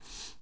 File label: breath heavy out; Apotheorasis